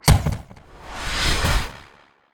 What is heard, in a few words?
arcane spell doppler shift; sonniss gdc